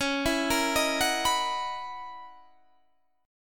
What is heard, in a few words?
Gb 13th chord shape